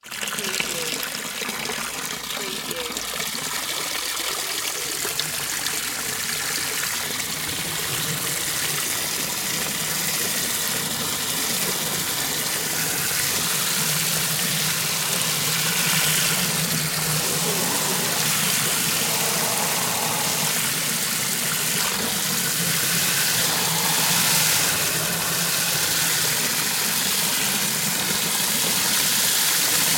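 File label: Water Hose Spray Fill; Sound Effects Bible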